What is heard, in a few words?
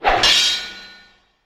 Scythe Clash Sound Sword; cmusounddesign; SyrinScape; Library Depths